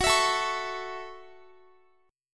Gb Minor 7th, Guitar; Gbm7; strummed; x,x,18,21,21,21

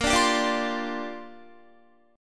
Bb 6th Add 9th Bb Guitar; Bb6add9 strummed

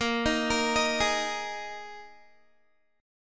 Bb 7th Suspended 4th Bb Guitar 4E,4A,5D,5G,5C,6F; arpeggiated

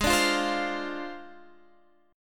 D Minor 7th Flat 5th G sharp

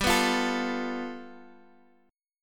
G 9th, Guitar, Open F; G9 strummed; 19,18,17,16,x,x

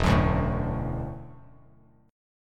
E 9th Suspended 4th E down Bass Standard; E9sus4 strummed; JGuitar

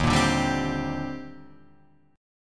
D sharp Major 13th, down, Guitar, Drop D

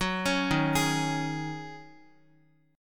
D sharp Minor 11th D sharp Guitar, chord; D#m11 arpeggiated

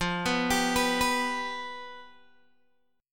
F Suspended 2nd Flat 5th, Guitar, Open G

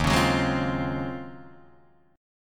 Eb Minor 13th Eb down Guitar DADGAD; Ebm13 strummed; JGuitar

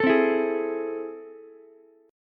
A Minor Major 7th, down, Ukulele; strummed; AmM7; JGuitar

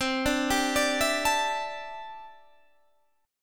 D 9th Suspended 4th C

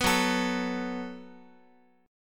Gb Minor Double Flat 5th, Guitar; Gbmbb5 strummed; 14,14,12,x,x,x

